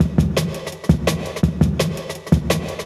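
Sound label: Jungle Breaks, 168 bpm; Original Jungle Breaks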